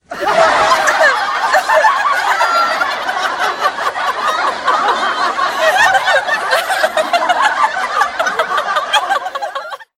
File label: laughing